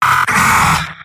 sniper pain severe; vo; tf2; mvm

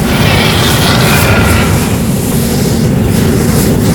flame thrower start; tf2

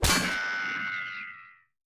rank down medal shoot impact

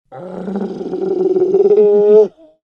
coconut scream

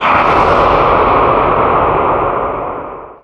male scream; Garry's Mod; tf2

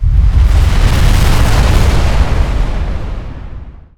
idle shockwave; tv titan mk2

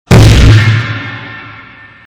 jump; triceradum

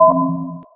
finale beep; Garry's Mod; tf2

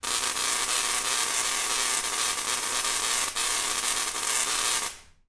tesla coil; Garry's Mod; omnidroid 16

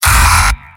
m pain sharp; demoman; tf2; garrysmod; mvm

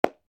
clap; pyro

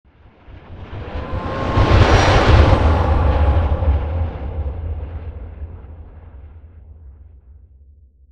jumpscare; venus

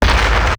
giant pyro step; Garry's Mod; tf2; mvm

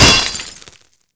wrench hit build success; tf2

